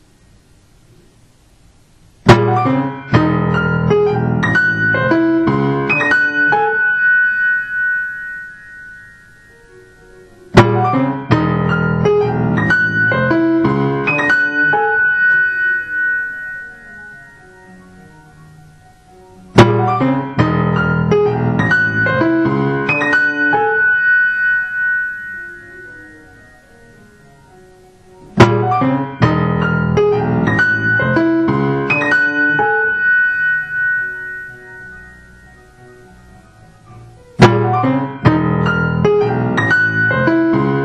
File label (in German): Klavierstück tempo calibration; Klavierstück I Version C Crux I